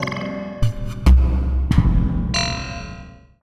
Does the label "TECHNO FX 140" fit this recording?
Yes